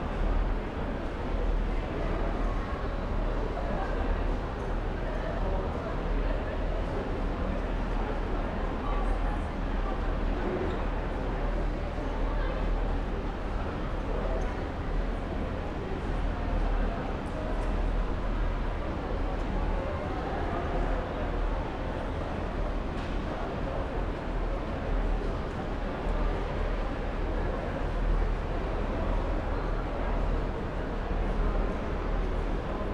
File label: Urban train station babble; urban and suburban; boom library